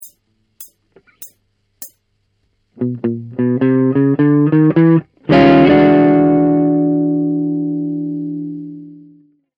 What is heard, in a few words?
blues